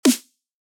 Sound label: freq shift; パーカッションのピッチ調整